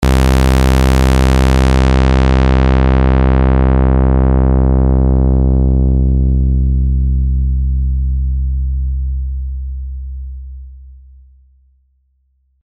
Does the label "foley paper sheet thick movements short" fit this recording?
No